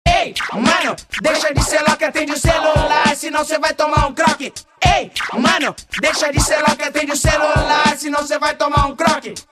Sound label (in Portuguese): Rap